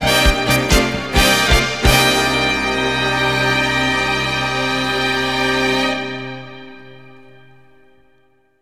win; Mobile Suit Gundam Z AEUG vs. Titans; ps2 gamerip 2003

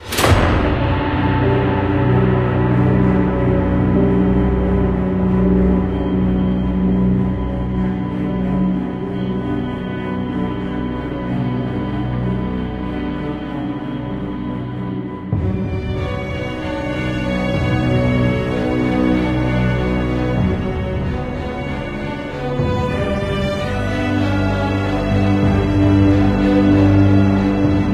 music Hospital

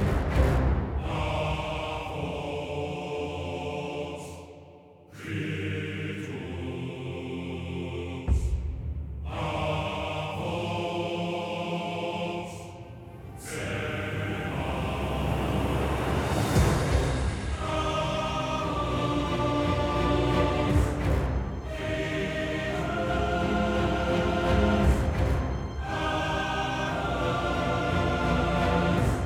Mood Tension Light

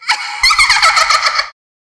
boot Sound; Run Run and Die; ps vita wii u windows gamerip 2015

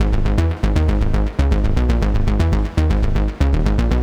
Main synth loop; Vostok Inc.

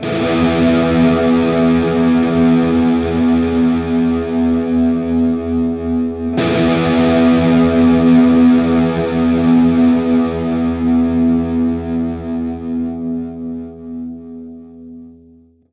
Power Gtr; Forest; Torchlight III; PS4

